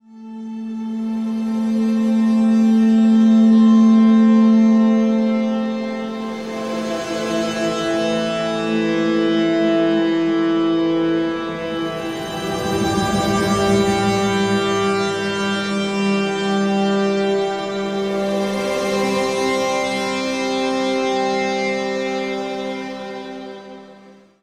Textural Soundscape, IDM

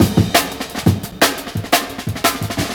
DNB BREAK

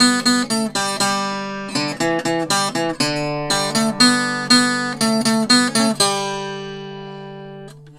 Tenor Guitar, Appalachian

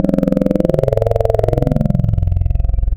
Vowel I Owu, wavetables; Waves Codex

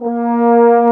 brass, FR HOR, F.Horns; Roland LCDP06 Brass Sections; F.Horns FX+

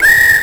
WHISTLE, SP1200; PlatinumPhattCD1; E-MU Producer Series Vol. 8 – Platinum Phatt

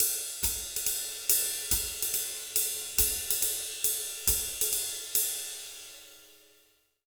Jazz Latin Drumloops, 140; USB Soundscan vol.08; AKAI

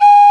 P WHISTLE, Old World Instruments, Winds

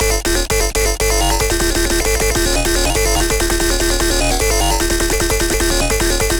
F Cyber Bass Loops 150

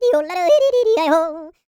YODEL; ILIO; Vocal Planet

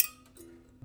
Prepared Piano; Battery 3 Sample Kits

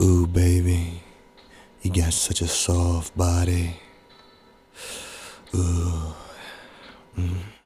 VOC, Hip Hop and R&B; Best Service ProSamples vol.02; AKAI

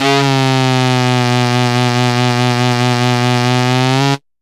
Sax Line; Dr. Fink's Funk Factory

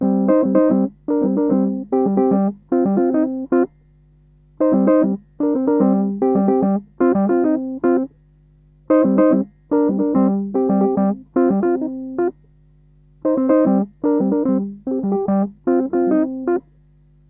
111 C DISCO WURLI; 111 BPM

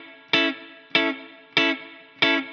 Tele Chop 95, Guitar Chops, dub; musicradar; dub designer; 95bpm

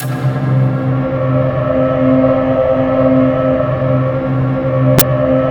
pit hit; Spectrasonic Distorted Reality 1

